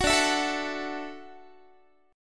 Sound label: D-Major Add 9th D down Guitar; Dadd9; strummed; 21,22,19,19,22,x